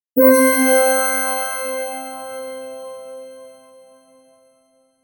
a short calm intro chime; digital-twin

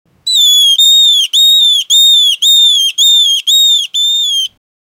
the cry of the platypus

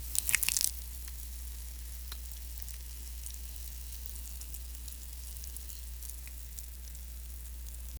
soft spice pouring grindin, cooking

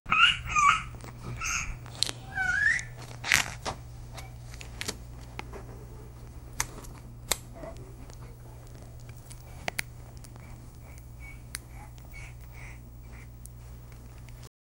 fast paced rescue sound

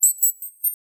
short authoritative sound

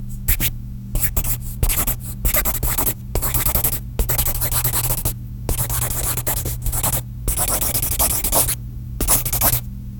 sound of a single straigh, stationary

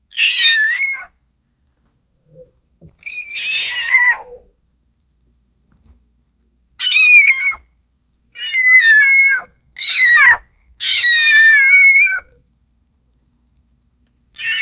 alien cats making noises

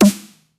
sniper single shoot sound

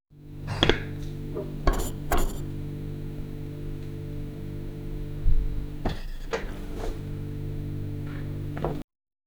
intro feminine cozy, lo-fi

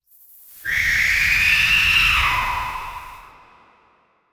whisper fx, vocal

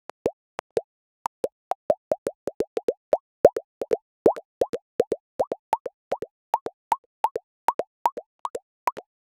wavy popping sounds

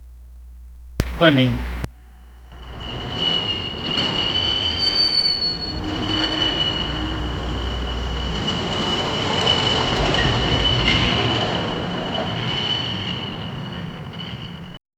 a loud screech of brakes; darkness

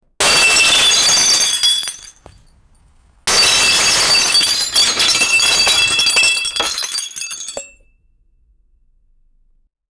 a loud crash of glass shattering on impact

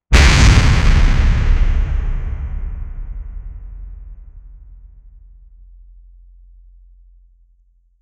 viking horn impact

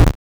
jump from a concrete, pixel game

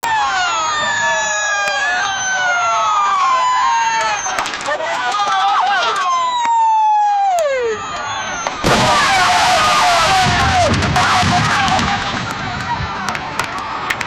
a shootout between police; law enforcement

